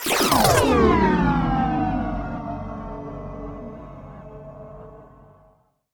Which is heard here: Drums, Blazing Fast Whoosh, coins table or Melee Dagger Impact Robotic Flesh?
Blazing Fast Whoosh